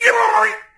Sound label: wolf; cstrike